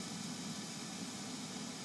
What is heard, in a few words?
Ambience Loop, outdoor